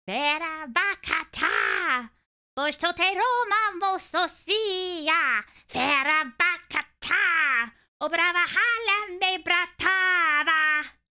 Sing; Hollow Knight: Silksong

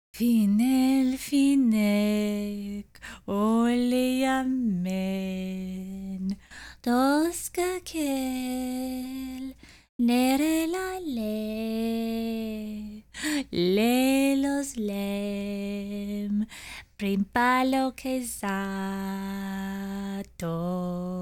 female sing

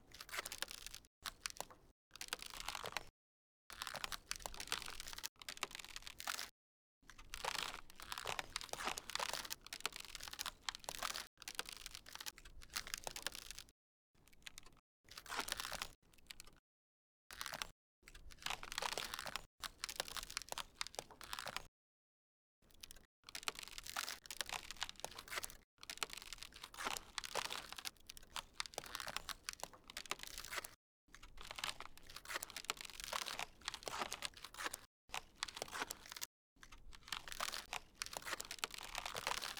frostbite loop; ui

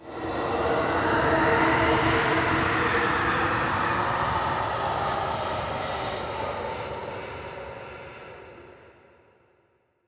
boss long scream probs death; Hollow Knight: Silksong; unravelled

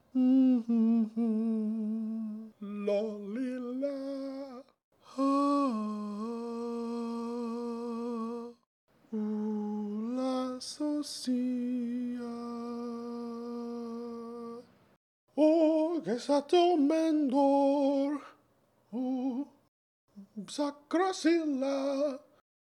idle hum loop; Hollow Knight: Silksong; Pavo

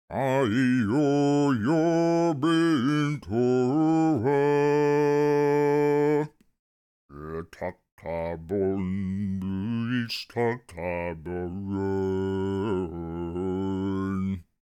sing

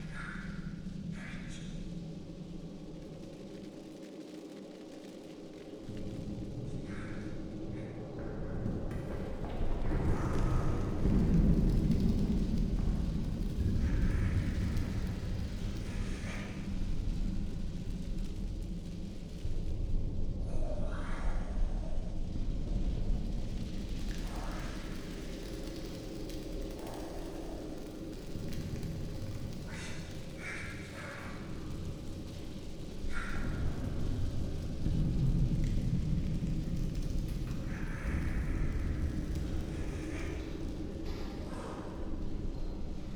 atmos ant new; Hollow Knight: Silksong

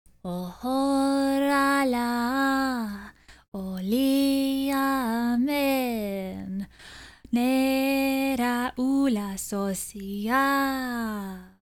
Pilgrim Female Sing; Hollow Knight: Silksong

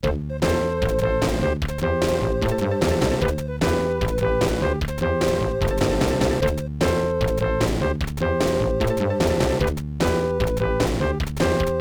Continue; Dr. Robotnik's Mean Bean Machine; The 16bit Audiophile Project; Genesis

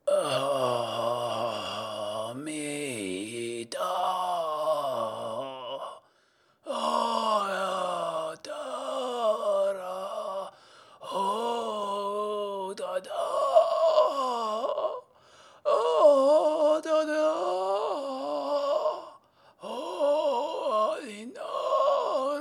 UF sing; Hollow Knight: Silksong

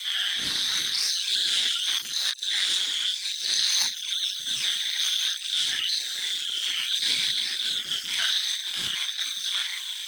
sing loop

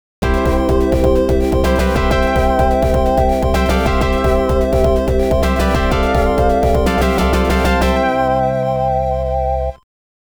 All Stages Clear; Mega Man The Wily Wars; The 16bit Audiophile Project; Genesis

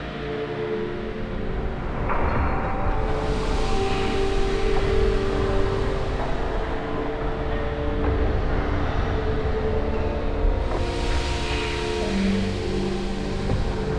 atmos exhaust organ outside w organ music

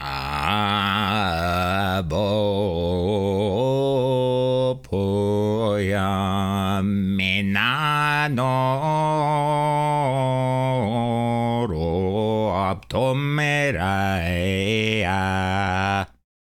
Male NPC Song; Hollow Knight: Silksong

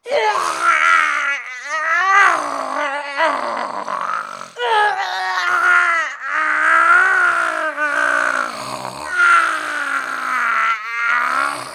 death scream loop

